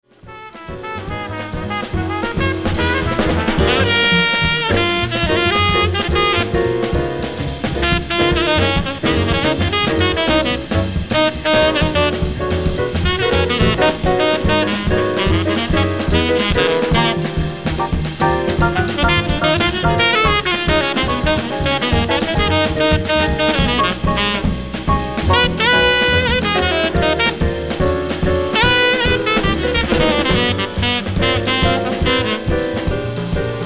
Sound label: Tenor Solo